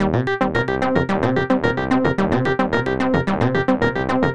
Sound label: 303 loops